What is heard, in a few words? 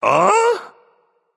confused, voicepack; smite; jorm